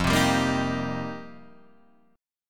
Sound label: F 9th Suspended 4th F Guitar Drop D, chord